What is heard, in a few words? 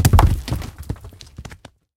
rock fall